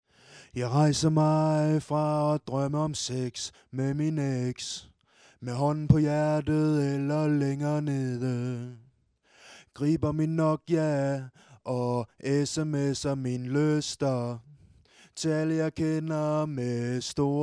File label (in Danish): vokal ejlesang sunget 98 bpm